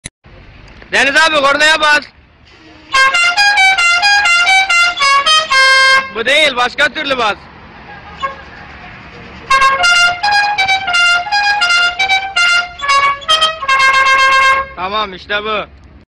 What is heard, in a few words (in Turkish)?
kornaya bas; deniz abi